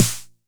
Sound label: 909 SD; Goldbaby Tape808 & Tape909; The Tape 909